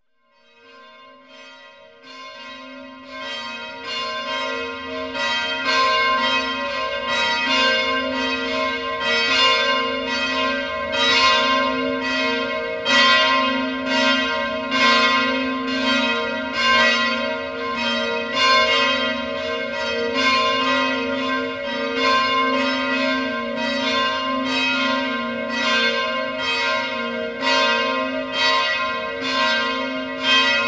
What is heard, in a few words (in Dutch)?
Kerkklokken Luiden; KMP Film Video Sound Effects